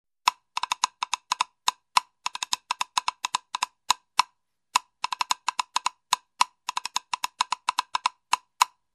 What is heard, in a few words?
Woodblock military rhythm